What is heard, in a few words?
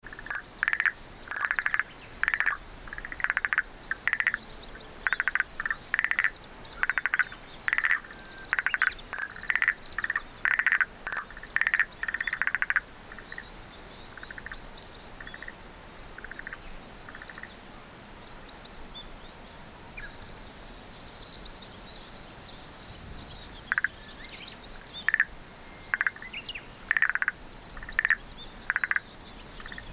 frog